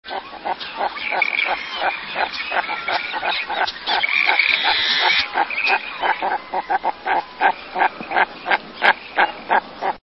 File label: Common Merganser, songs